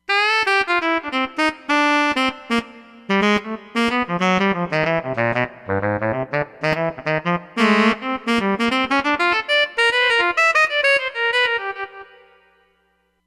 Fat Tenor Sax

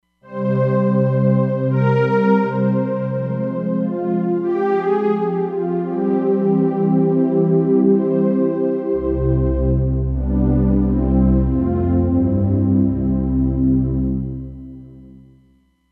Soft Breeze, Roland Fantom XR

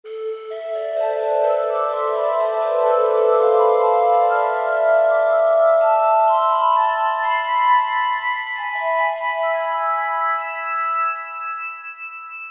Space Pipe, Roland SR-JV80-05 World

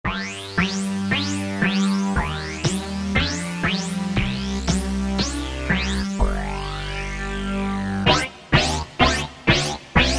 Squishy Swp, Alesis Z5 Vintage Synthesizers; Vintage Synthesizers And Classic Beatboxes

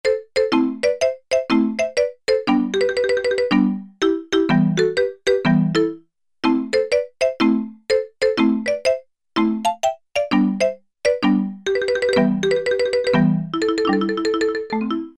Marimba, E-mu Pro-Cussion; Factory ROM kits

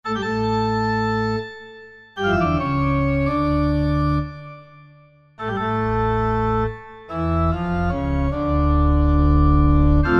Nave, Yamaha TG500

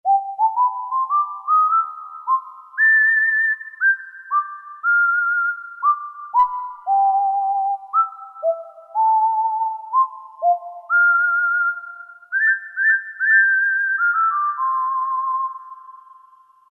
Whistle

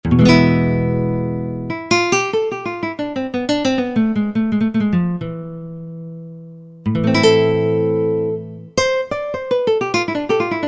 Nylon Guitar, Roland JD-990; Factory Patches

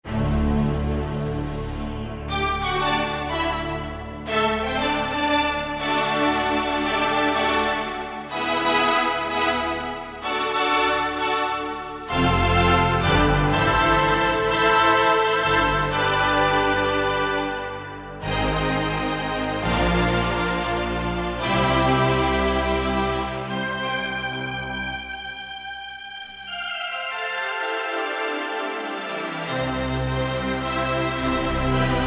7th World String, Kurzweil K2000; Kurzweil K2000 V3; Factory Programs

